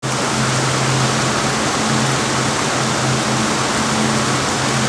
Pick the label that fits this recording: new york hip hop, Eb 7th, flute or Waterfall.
Waterfall